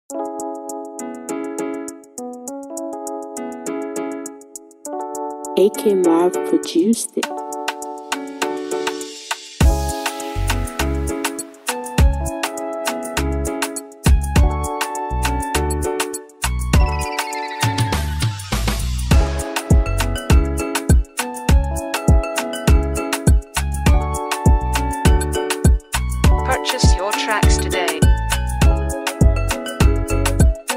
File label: Afro Beat Instrumental